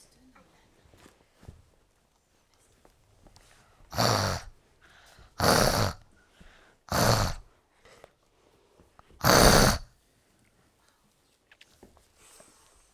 tigre

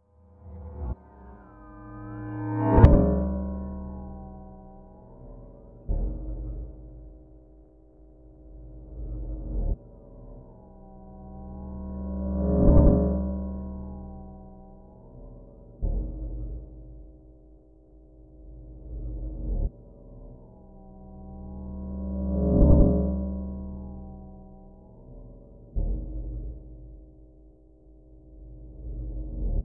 corde slidée

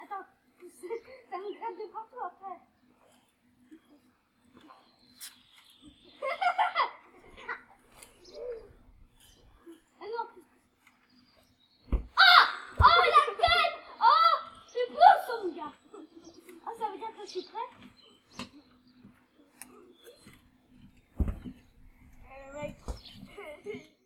enfants ballon

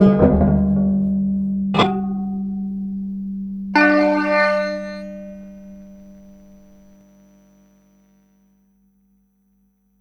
frottés

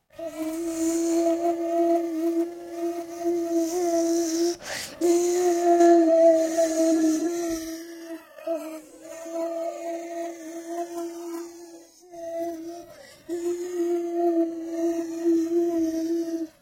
moustique

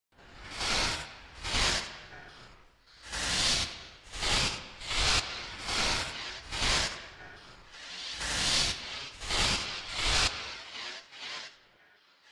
scotch

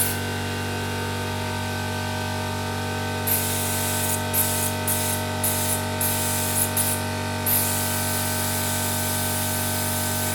compresseur

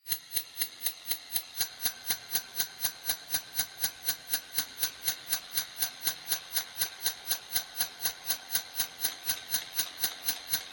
corde trombone

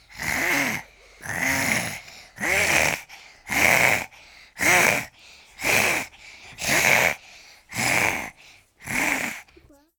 dinosaure

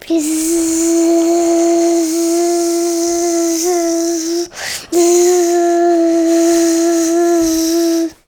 moustique

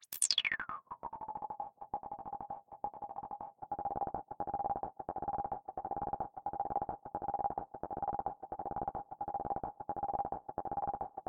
billes

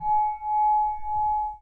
verre

